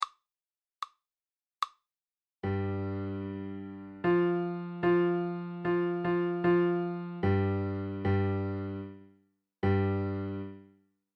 minor 3; intervals

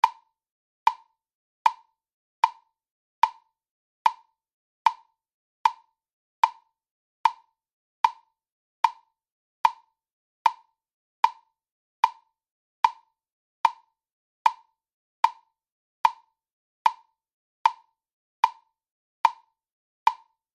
metronome

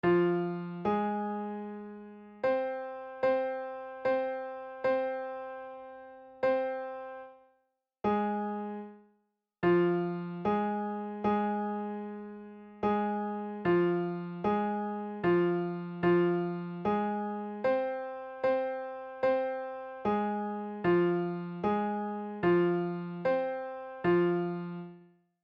minor triad; intervals

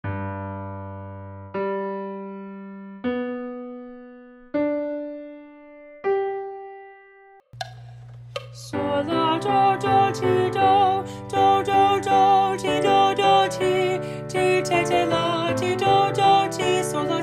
now is the month of maying alto solfege; morley

